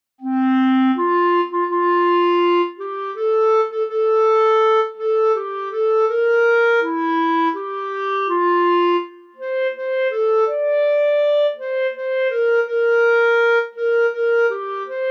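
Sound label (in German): solo Bassklarinette; O Tannenbaum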